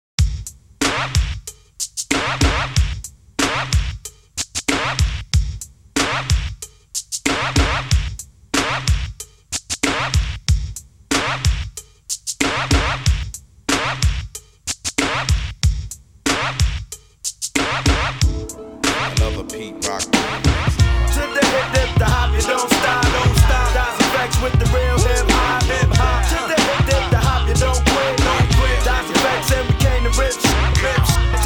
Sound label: Real Hip Hop